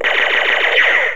sound firing deluxe 4shot megatag

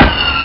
firing

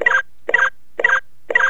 lock on teammate

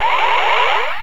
STRIKER ON

